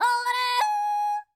YODEL, World Voices; ILIO; Vocal Planet

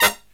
HIGH HIT

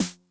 Snare Mid Tuning Mid Tone Mid Snap, 909; classic machines

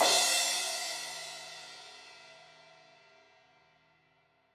Crash 16in edge, Cymbals; Deeply Sampled Drums

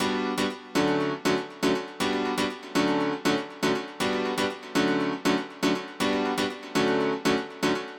Piano Part, 120bpm D; Zero-G; Nu Disco; Happy People

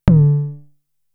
Tom, Toms; Fricke Schlagzwerg; Drum Machines

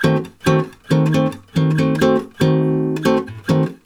D# DIM GTR, Brazil Bossa; USB Soundscan; AKAI

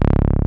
TB303, Synth Basses; E-MU Formula 4000 Series Vol. 2

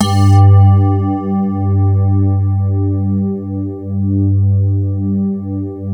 crystal pad; Sound Gallery - Charged

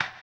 HAMBONE; ILIO; Vocal Planet VOL-2; Blues & Soul

